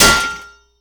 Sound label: Armour Helmet Hit Hard; SFX Kit; Creaks, Scrapes, & Misc